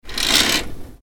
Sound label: Blinds Closing